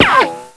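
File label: ricochet concrete; arccw go; legacy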